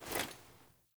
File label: Foley Action Default Sword Throw, Foley Default Clothing; Characters; Player